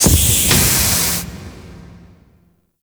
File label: Organ Pipe Open; Ambience; Gameplay; Warframe; Orokin Moon